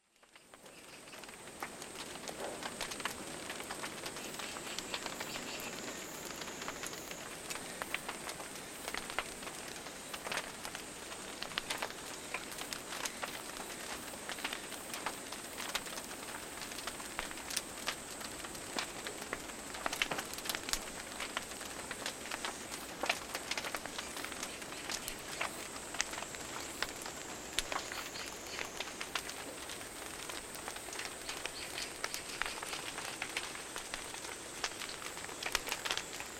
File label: Ambient; Age of Empires III; cinematics